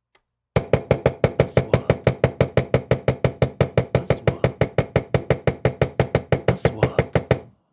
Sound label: Wood